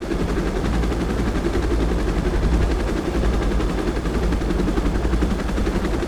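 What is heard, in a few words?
rotor, helicopter; Total Control - Action Cutz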